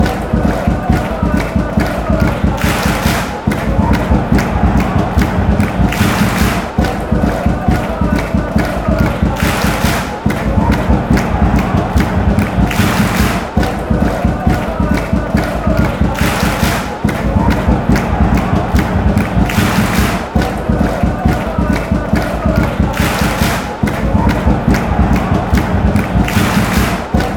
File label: claps, fanchants